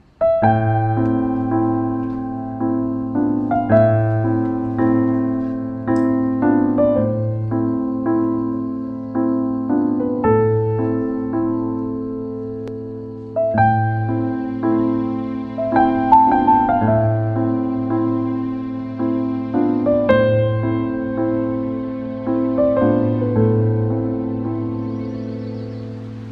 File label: Melancolic Piano